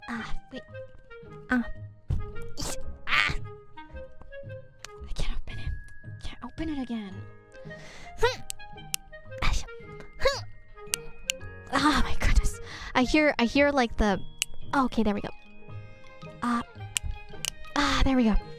hope noises

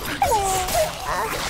Sneeze

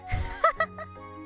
laugh

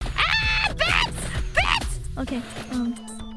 aaarrrrggg bat bat bat; IRyScream

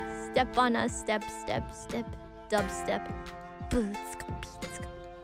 beatbox; IRyS; stepping on us and then beatbox

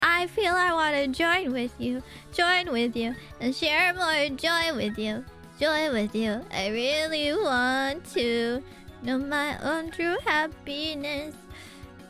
chorus; IRySinging

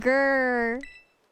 grrrrr

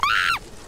Scream; IRyScream

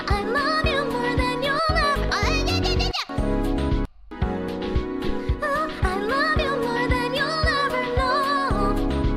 karaoke; IRySinging

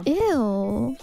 eeeww

